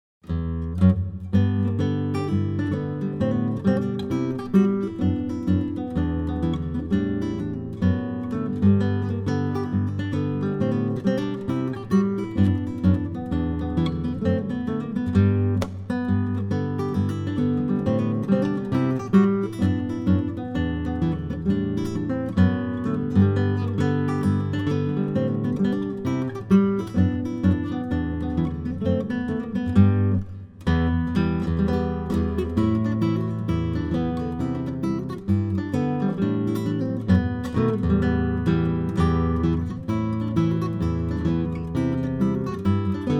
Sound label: fiddle g